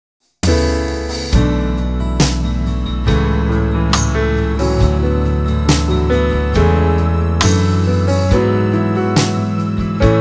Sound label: INSTRUMENTAL